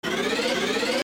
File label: wind; End of Aspiration; android